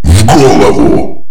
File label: headshot